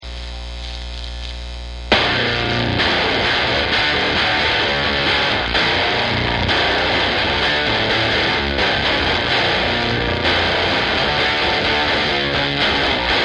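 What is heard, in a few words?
fuzz samples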